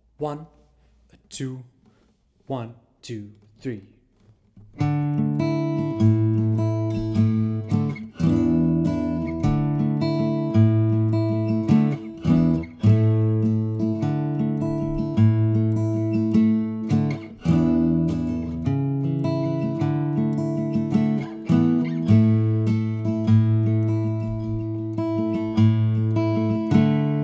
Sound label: Guitar Full Speed; The Miller of Drone